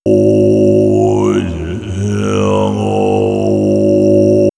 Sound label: Monks, vox; Bardo Thödol; X-Static Goldmine; vox mix